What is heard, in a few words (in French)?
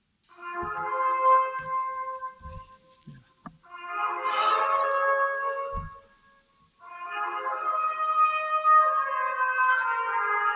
musique trompette andante